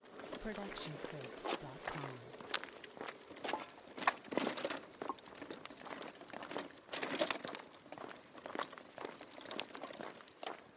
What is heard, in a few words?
golf sounds walking with wheel push cart; mono; soundscrate